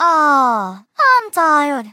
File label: Tired; Teto; SM64 CO-OP; Triple Baka Pack